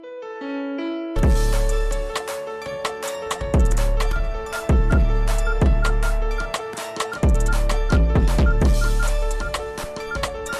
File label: nokia ringtone; nokia c1 2010